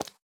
青蛙脚步声

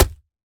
泥坯破坏声

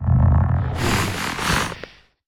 监守者嗅闻声

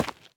滴水石踩踏声

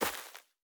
垂根踩踏声

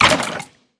凋灵骷髅受伤声

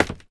脚手架踩踏声

